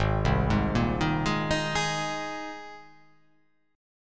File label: Ab Major Add 9th, Abadd9 chord; Abadd9 arpeggiated; JGuitar